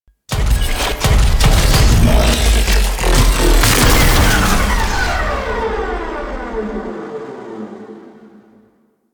Mech; Warframe; The New War; Erra Beam Sacrifice

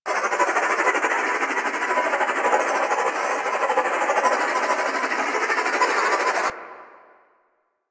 Barrage Loop; Warframe; Warframes

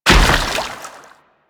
Step Water, Footsteps; Warframe

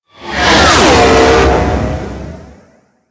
Ship Close Short Fly By, Engines; Warframe; Vehicles; RailJack; RailJack Enemy Ships; Grineer; Engines Generic

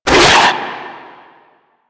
Arm Attack; Warframe; Infested Ninety Nine

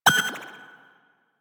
Missile Warning, UI; Warframe; Archwing

